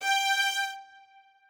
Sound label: strings